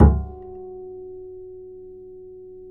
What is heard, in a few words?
F#4, bass, acoustic fx; Spectrasonics; Bass Legends